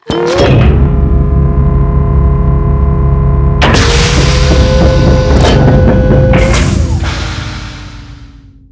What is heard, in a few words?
reactor opening